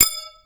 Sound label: ting TING